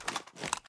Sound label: raise first extend, reloads; iw8; uzulu; gmod